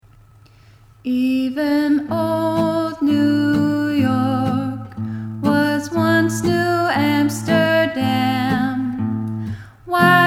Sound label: chorus harmony